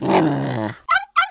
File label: baby leopard stand; classicro